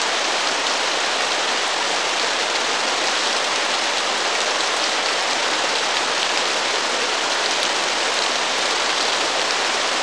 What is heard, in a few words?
waterfall